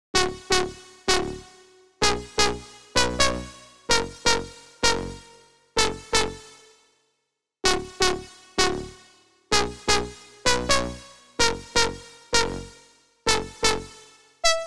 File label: 128 BPM F# Min Synth; House; Drop Loops; Cymatics; Mothership